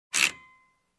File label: Machine Spring Ding